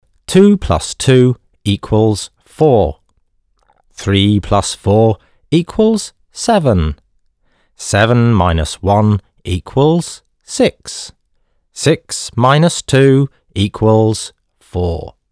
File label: teapot